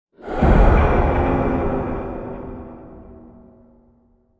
Metal Ghost

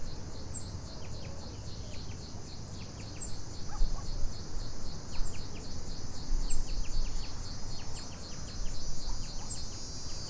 Blue-Dacnis, پرنده توتخوار آبی; صدای پرنده توتخوار آبی

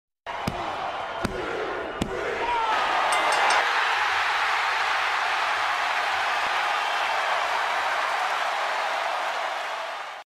wrestling

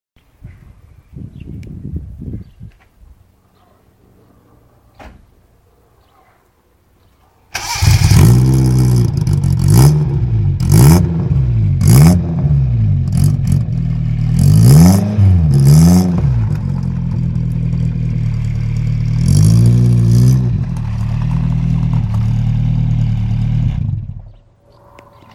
suzuki carry

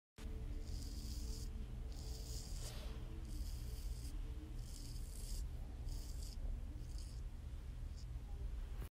brushing eyelashes

